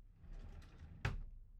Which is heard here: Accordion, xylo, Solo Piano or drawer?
drawer